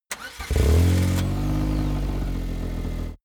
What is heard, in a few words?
car buggy start engine